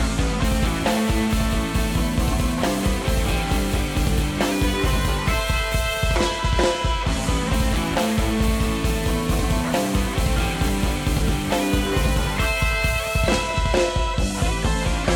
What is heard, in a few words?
music battle